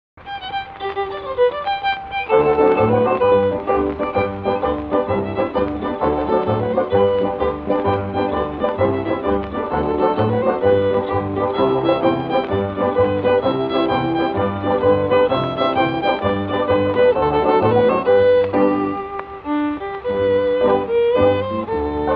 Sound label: instrumental